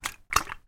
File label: food drink dregs of soda drink in bottle tip to side